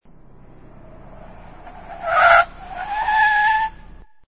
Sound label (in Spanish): autos; Sonidos/transporte; Autos 8